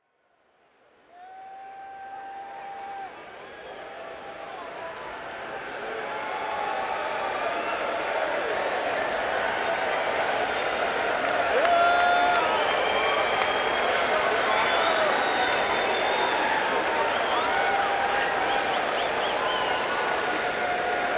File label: Crowd; Uncasville, CT - Mohegan Sun Arena